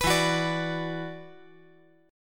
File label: E 7th Suspended 2nd, down